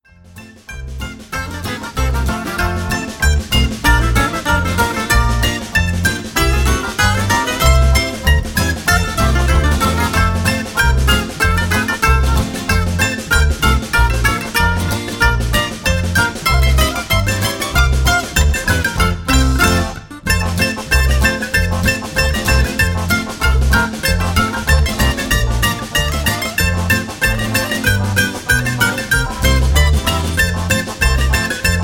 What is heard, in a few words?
jingle bells